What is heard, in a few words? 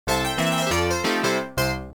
PIANO AWARD; Twilight Zone; pinball